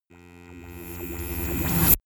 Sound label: rise buzz deep